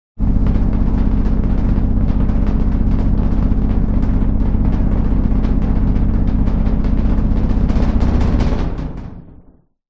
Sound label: vehicle underwater or space resonation metal; lukas tvrdon